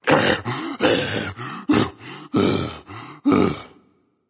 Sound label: breath berserk; cuchulainn